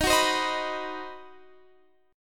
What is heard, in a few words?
C Minor Major 7th Flat 5th Eb, chord